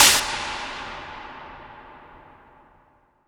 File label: IMP SNR DRUM, Drum, Cinematic Impact